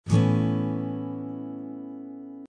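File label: 1 shot acoustic guitar chord A; Lounge & Reggae; Loopology; Be Bop Baby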